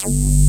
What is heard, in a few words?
FILTER PLS BS; Pro-Rec - Synthsonic; BASSES